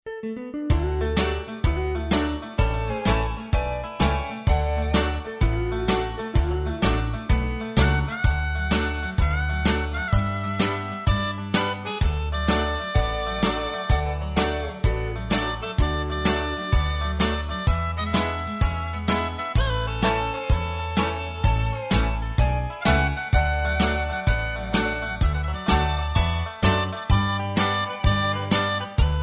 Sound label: music